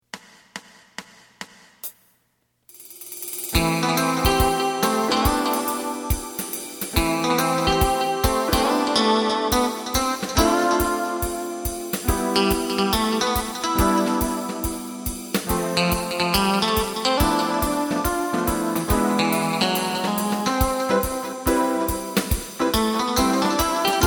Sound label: bass